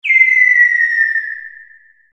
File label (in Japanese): hanabi